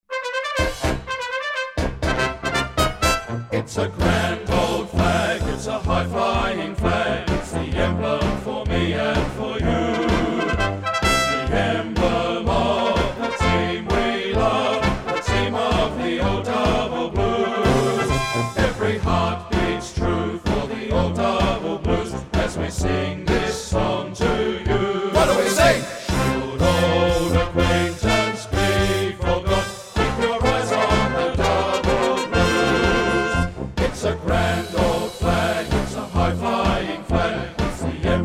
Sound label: Grand Ol Flag Traditional with Drums; Plays Once; Grand Ol Flag Traditional Remastered with Drums